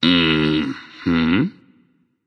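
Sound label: nonverbal agree; smite; voicepack; merlin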